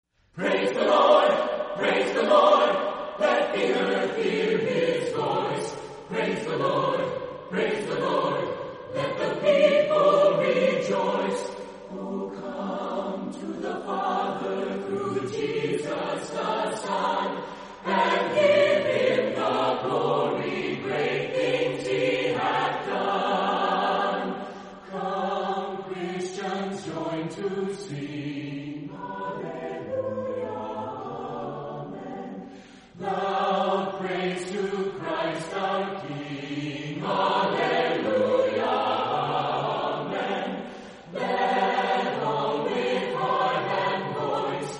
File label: Chorale, Voices in Praise acappella